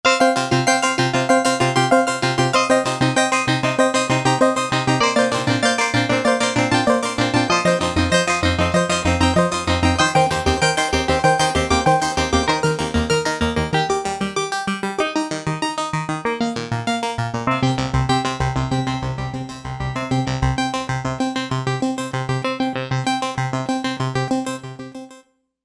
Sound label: Modular Clocks, synth